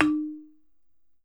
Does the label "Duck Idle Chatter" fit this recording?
No